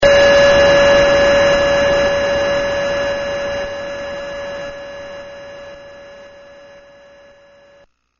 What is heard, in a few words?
Throw Water; PC-6001; 水をぶん投げる; コロニーオデッセイ